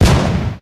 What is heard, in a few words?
enemies exploding explodes; spider-man-mysterio-rush-game